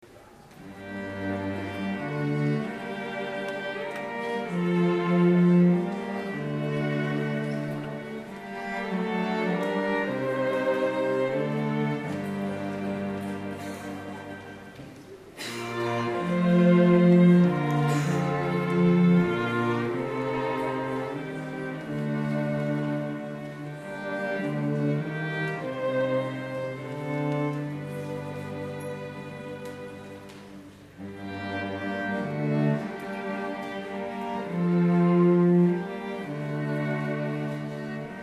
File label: Coventry Carol